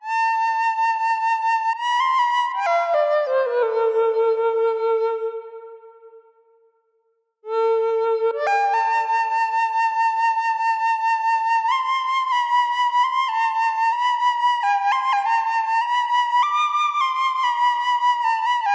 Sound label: muhayyer synth